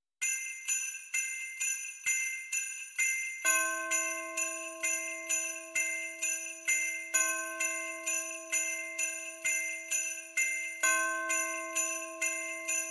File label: Ringing the Holiday Bells